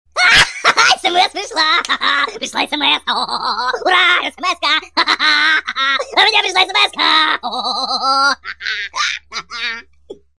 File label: haha hoho ringtone